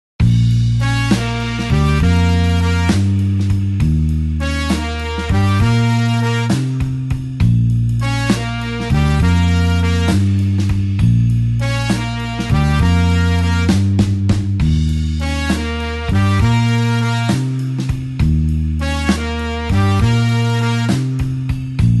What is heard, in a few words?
blues horns slow Bb